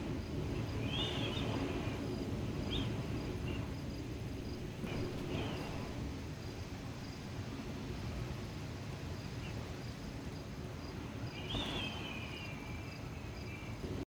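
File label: wind sound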